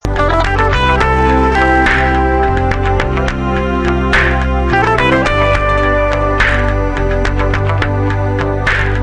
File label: guitar loop